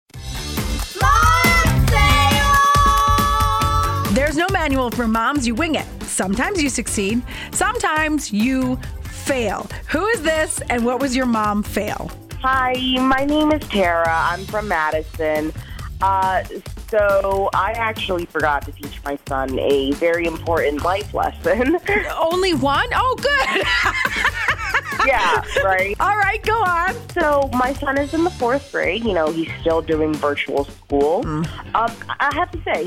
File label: son farted virtual school